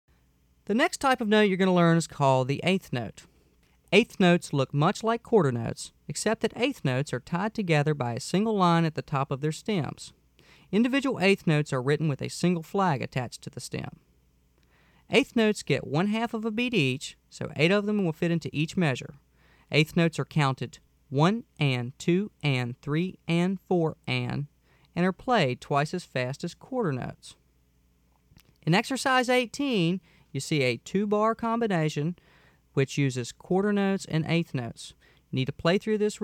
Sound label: Eighth Notes